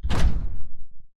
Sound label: atlas footstep jog; Titanfall 2